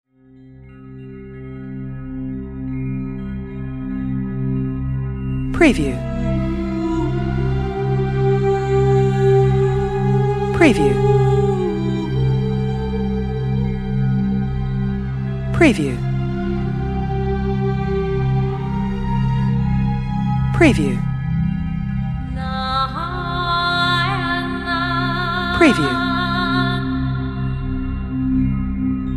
Dramatic Emotional Exotic Sad Female Voice Soundtrack